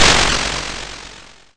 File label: Snare, YM, CHIPSHOP HITS DRUMS; ChipShop Deluxe